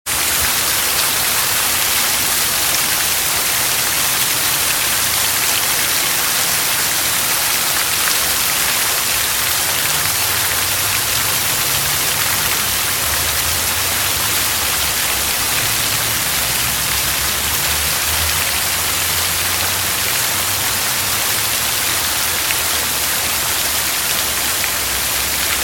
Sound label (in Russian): bolshoj gorodskoj fontan